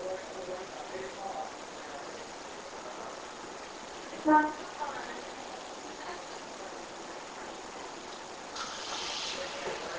Unexplained horn sound or something moved on the wooding floors